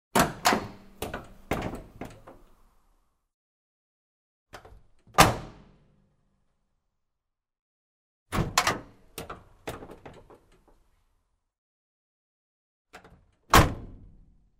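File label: opening and closing of truck cab door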